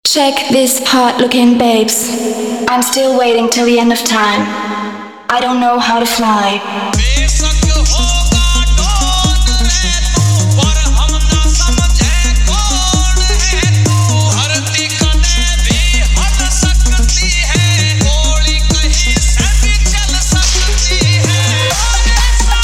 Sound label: Edm Drop Mix